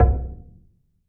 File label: E-Perc Knock Ambient, Electronic Percussion; One Shots; Drums; Sound Objects; Ableton Live 12 Lite; Core Library